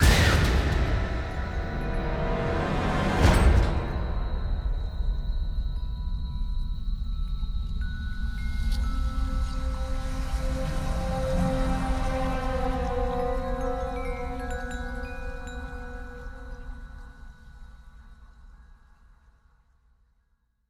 shock instant; Joe's Diner

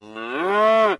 Sfx - Calf Panic

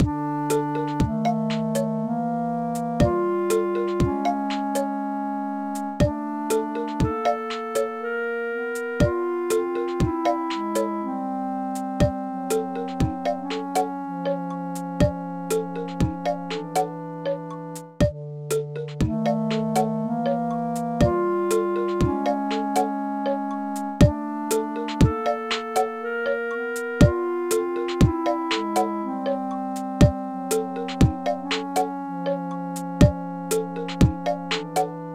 BGM Forest; Irodzuki Tingle no Koi no Balloon Trip 2009 NDS gamerip

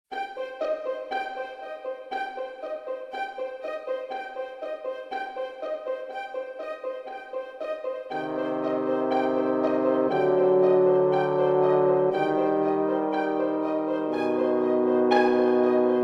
Impending Boom Intro

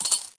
Coins; Learn to Fly 3

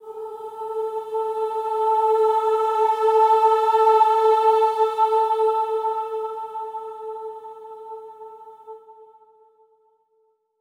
Low Health; Void Bastards; Xonnox

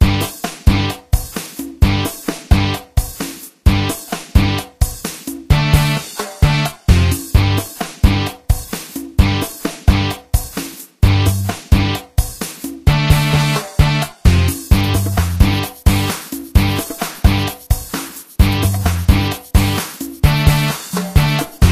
Rock Radio; Gangstar Rio: City of Saints; Java; Android

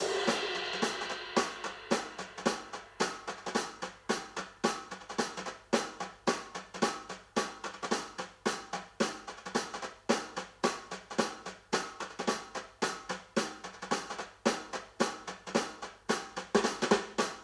Loading Loop

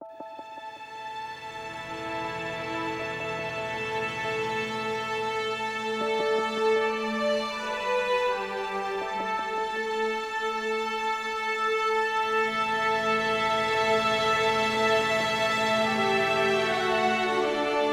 Main Menu Music

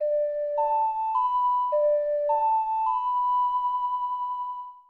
Ocarina; legend of zelda the majora s mask 2000 n64 gamerip

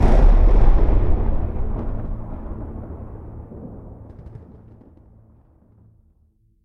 Combo; Mixolumia

